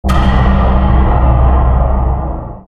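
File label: mysterious percussion, halloween; Garry's Mod; tf2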